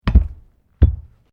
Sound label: Weights Dumbell Put Down; Gym weight lifting equipment; Sound Effects:Sports